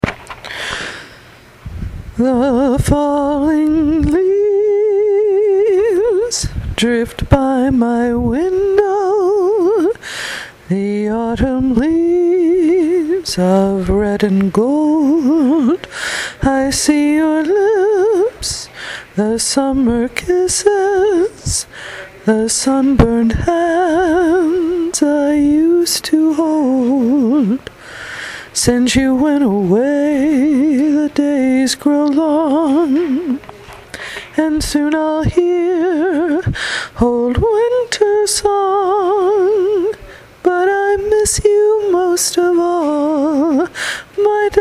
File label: vocal